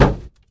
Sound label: click, turret floor; hl2